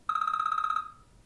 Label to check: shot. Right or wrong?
Wrong